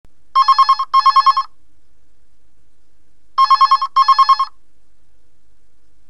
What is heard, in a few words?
Low Beep, alarms